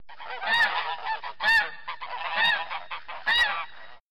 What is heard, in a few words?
goose